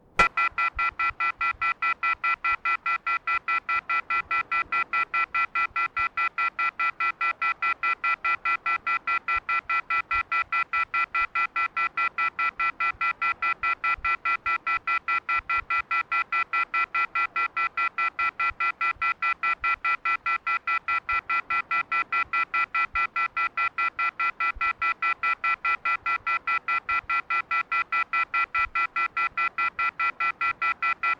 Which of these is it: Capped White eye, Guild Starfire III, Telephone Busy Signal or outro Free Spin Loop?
Telephone Busy Signal